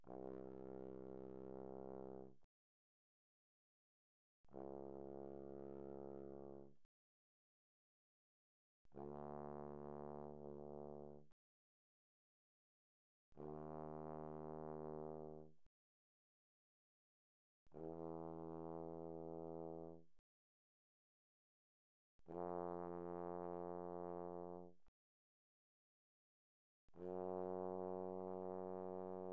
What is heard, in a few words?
Bass Trombone, pp, C2 B2; Brass